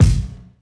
prominent kick single hit g key; kick drum samples

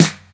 short steel snare drum sample e key; snare drum samples

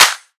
dry clap one shot D key; single hits one shots

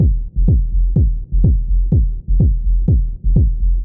house techno beat passage 124bpm, fm, 125; drum loops

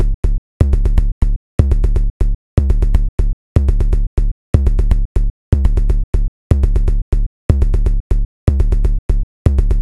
house techno fat kick construction melody 122bpm; kick drum samples

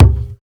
punchy kick c sharp key; kick drum samples

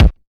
mid heavy kick drum sound d sharp key

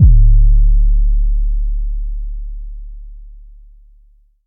phonk kicks oneshot 808 D; kick drum

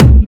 rich mid range kick drum one shot c key

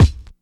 hip hop kick one shot d key; modern kick drum

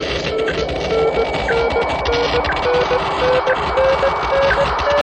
progressive pitch beeps

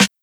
crunchy snare sample g key

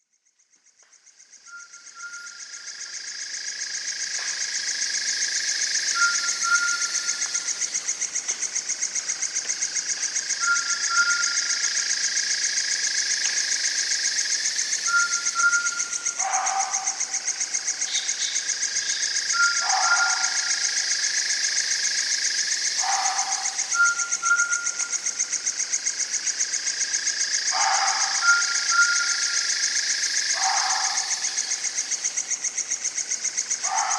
owls and barking deer; soundscapes

